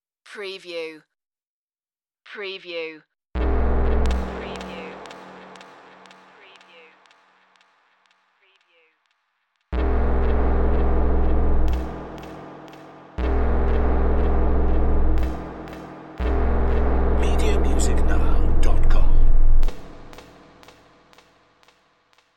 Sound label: Wicked Low